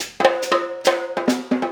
Dumbek; Essential Percussion

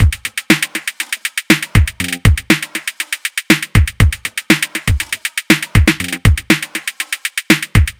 Disco Beat, Drum Loop; Disco Dijunkt Beat; Sonic Foundry (Sony Creative Software); The Electronic Music Manuscript Collection; Drum Loop Structures

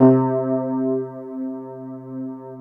WET ROAD C2 R, Keyboards Old School; USB Soundscan vol.09; AKAI

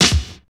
TEK DRUM, INDUSTRIAL; PRC INDUSTRIAL; Roland - LA Composer vol. 1

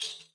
riq zil pp, hand drums; SoundBytes - Early Patches

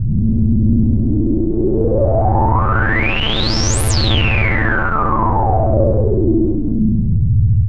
Mini Swell; SFX & One-Shots

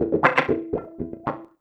120 WAH WAH, Electric Guitar Loops

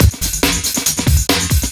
LoFi Beats, 140 BPM

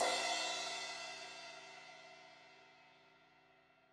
Crash 16in edge, Cymbals

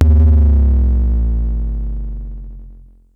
KLONE BASS MOD, Klone Dual-Percussion-Synthesiser; Drum Machines

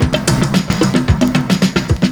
PERCS, 70 s Breakbeats

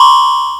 perc; Mono

